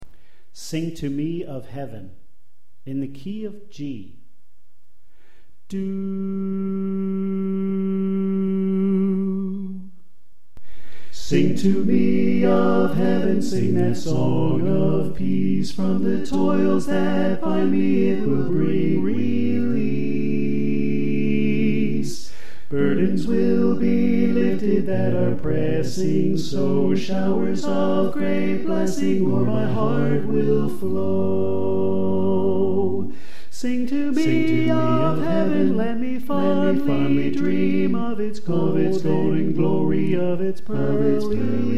Alto Left

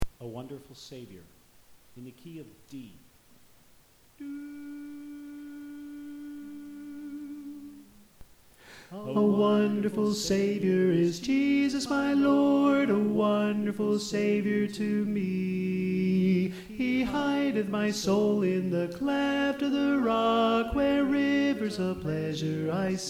Tenor; A Wonderful Savior

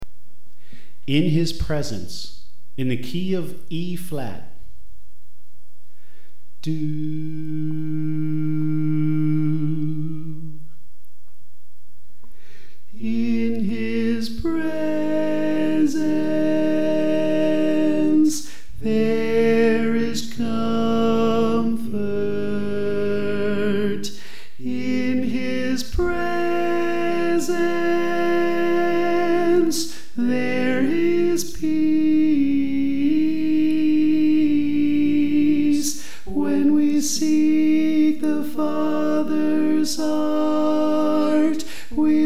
Alto

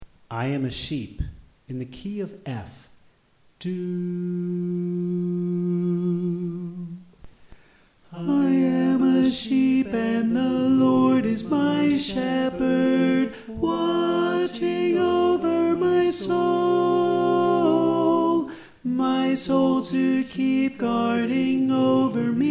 Alto

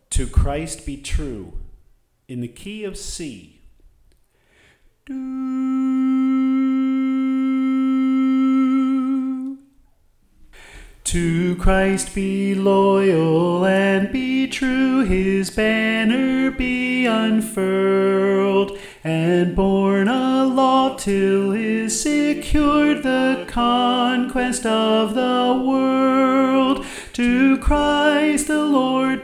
Soprano

